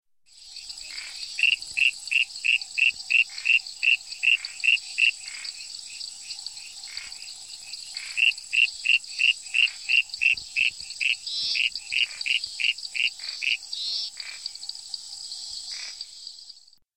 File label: Hyla Leucophyllata; amphibia